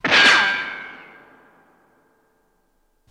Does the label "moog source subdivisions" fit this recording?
No